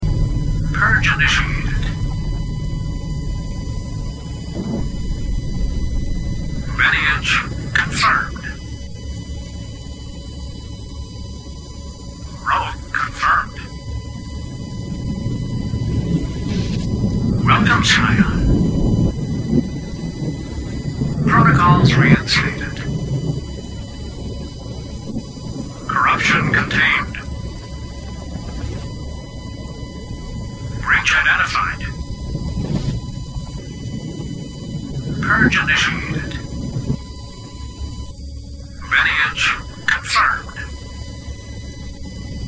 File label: Data Pad audio reversed; Reach